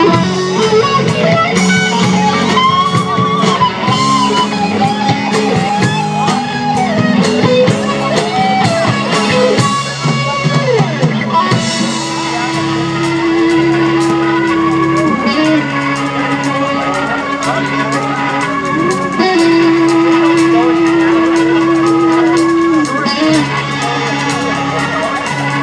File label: guitar